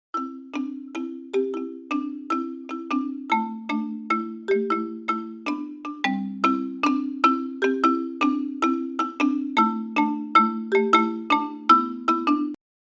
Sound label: Google Pixel 4 Jump Alarm; tono de llamada